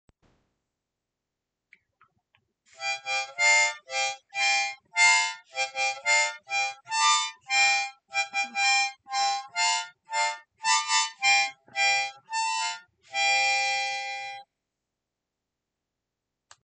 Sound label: messy, harmonica, Happy Birthday; for the “messy” version, it now sounds way more like happy birthday